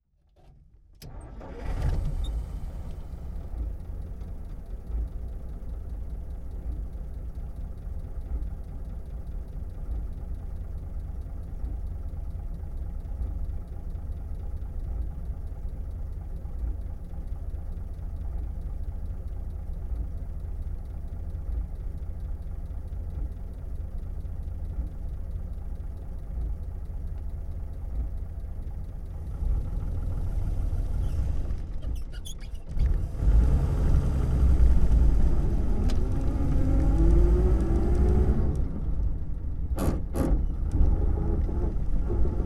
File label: 1937 Plymouth Int Start Idle Away Stop, Period Vehicles